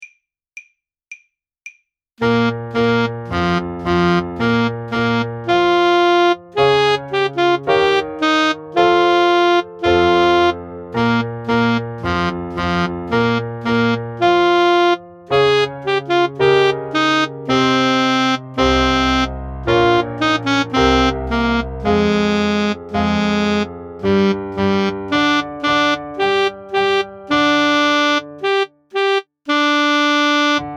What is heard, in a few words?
cossack dance sax